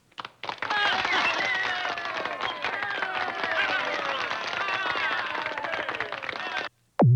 Small Crowd Cheering

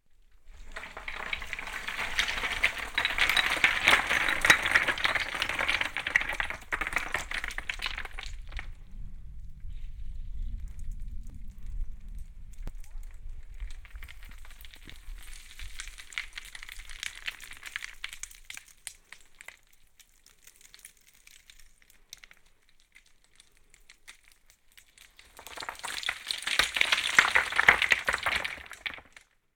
Stones Falling In Quarry Binaural

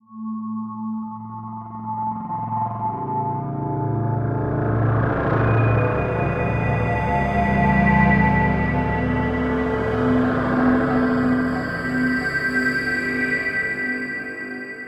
Ambiguous

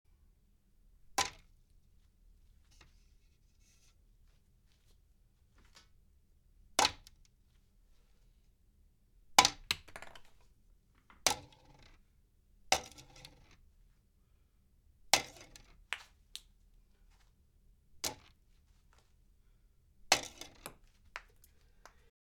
Beer Pong Sounds With Liquid

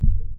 Click

